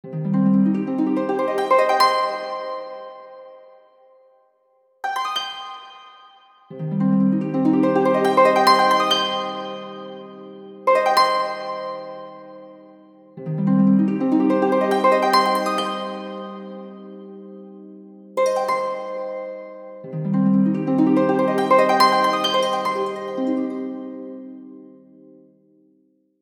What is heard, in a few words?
harp arp; HTC One-X; alarms